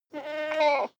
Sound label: goat baa shy male